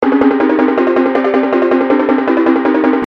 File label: Timpani